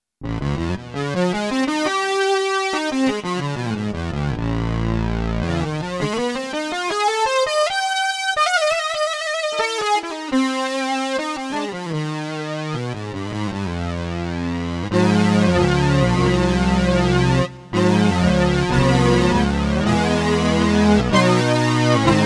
e guit 9et, 9-edo

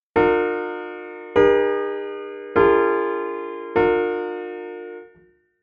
17 cadences, 17-ET

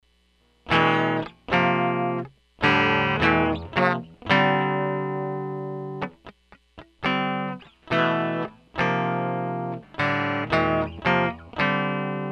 23edo

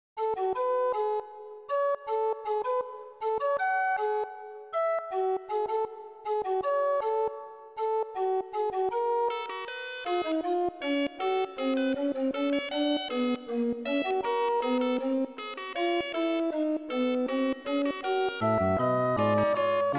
Canon 11 JI organ; Enigma

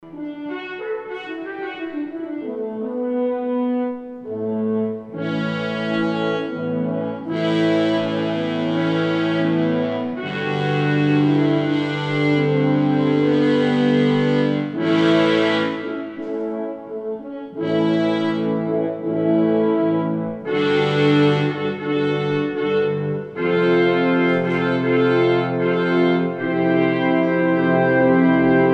brass ensemble adaptive JI

